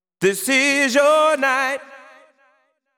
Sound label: deep house male, 125, A, vocals